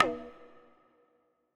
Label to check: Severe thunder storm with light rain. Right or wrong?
Wrong